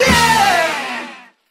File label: yeahhhh